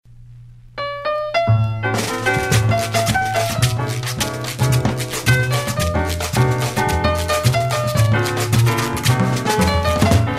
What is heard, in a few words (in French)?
orchestral